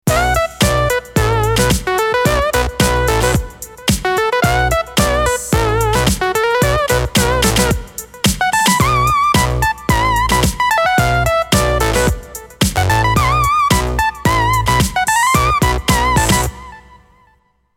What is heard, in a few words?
russian filter funkey